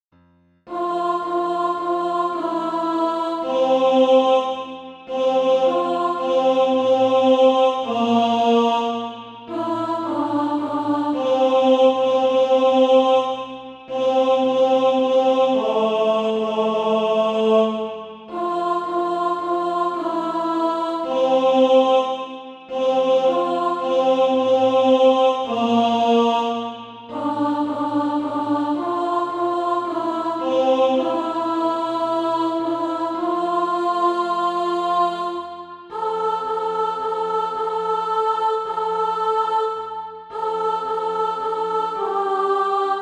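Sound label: alto, SATB Practise Music; Down from His Glory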